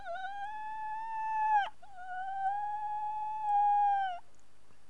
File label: whistle low